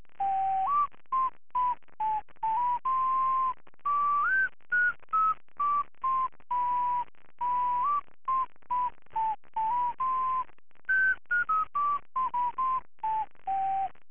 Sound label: ocarina